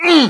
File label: hit, pain; mohaa